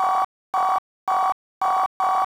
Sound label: Sine Wave